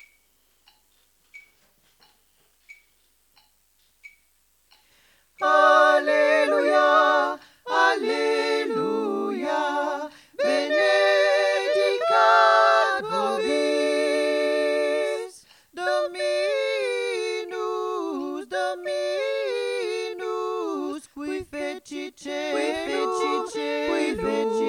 benedicat vobis tutti

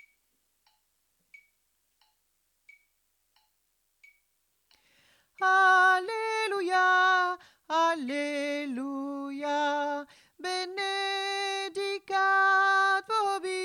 alto; benedicat vobis

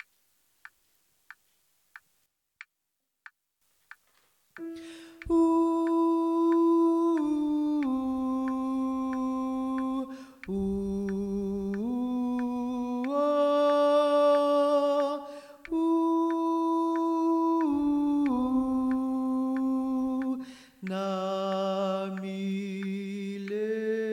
basses

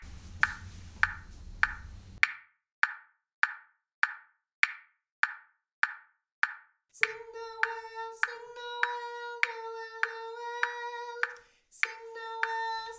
african noel

